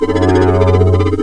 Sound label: pulse